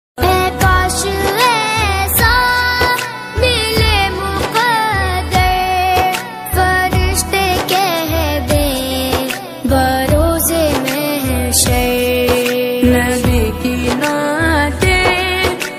beautiful islamic ringtone naat ringtone arabic ringtone